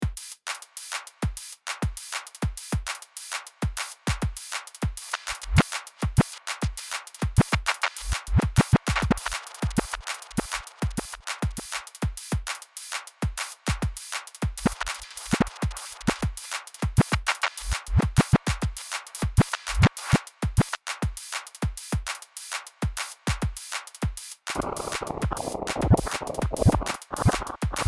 hologram electronics microcosm midisync drumloop seq